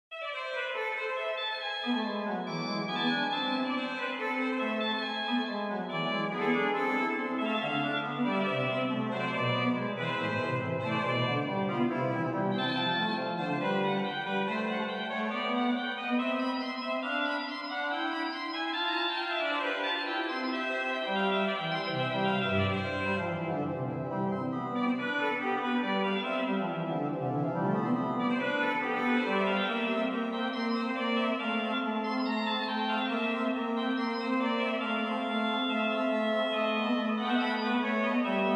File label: Gloria Duo, Organ Music, Souvigny; Beauvarlet Charpentier Journal